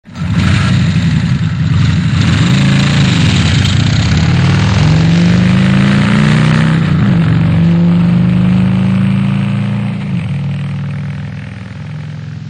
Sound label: HD Twin Cam; HD FLSTC; motos